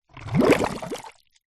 nature water short bubbles bubbling air blow into water in plastic pot